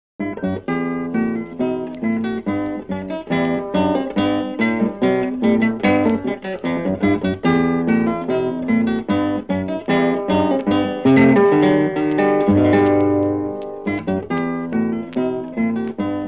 Bach Lute Suite in E Minor (BWV 996) - Bourree, Guitar